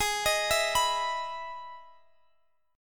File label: E Major 7th G sharp